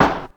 Snare, Ensoniq ASR-X Pro

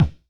Punch Little Dry, Kicks; Airwindows

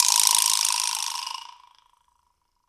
vibraslap; Big Fish Audio; Ross Garfield Drums VOL-2; GM PERCUSSN

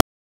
Rimshot - Rim, Wiard 300-Series Modular

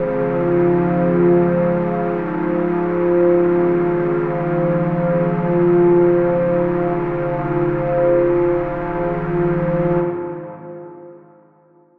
drone scraped F

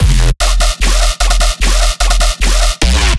bass loop 150 E; Virtual Riot

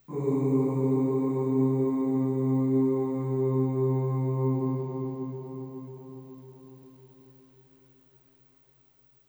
Ooh Long C2 Long Reverb; Harmonic Building Blocks

